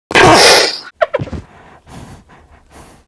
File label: Rein Deer Action